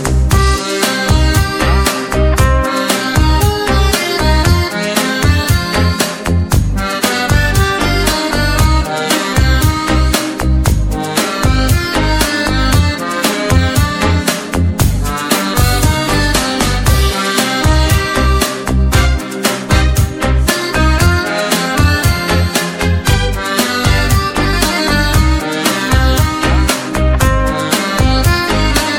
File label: orchestra